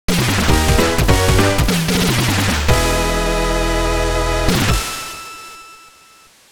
Win Jingle

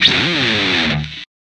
Guitar FX

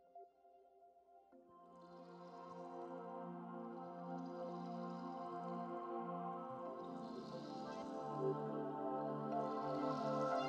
instrumental version

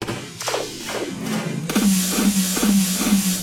Percussion Fill

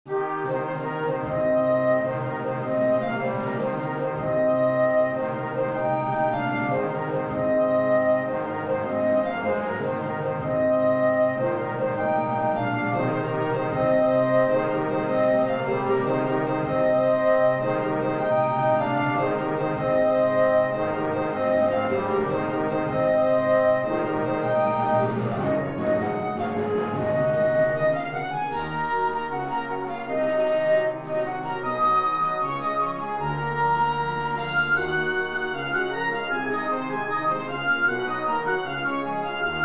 Wind Orchestra

